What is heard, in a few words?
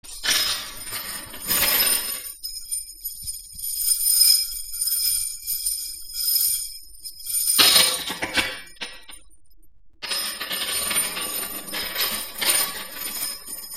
chains